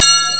Hard Driv; rocko

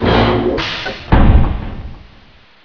DR FrplDr Up; Inside Mac Games; Myst Demo; Myst Preview

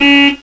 hup; tumbler street

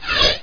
bow draw; Heretic II Demo; Weapons

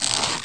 rope creak; Ferazel's Wand Demo

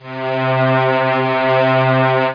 slow strings C3; Star Trek JR Audio

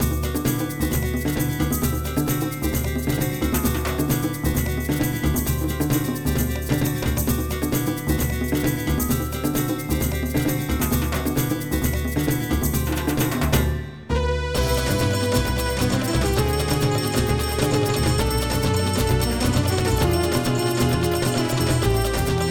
samba; TRAFFIC2

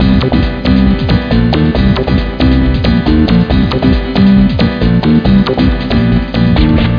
rave

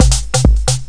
LITTLE PERCUSSION LOOP

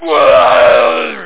Rarrgh

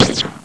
Shot; BOOM

